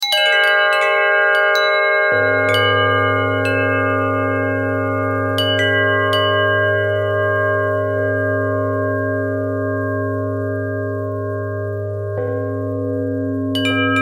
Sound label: bell harmonic twist, bells